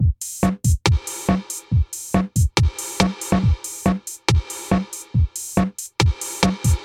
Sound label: drum; widdagroovebox